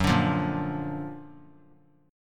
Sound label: Gb Augmented Major 7th Gb down 3C,3F,3A,3D; Gb+M7 strummed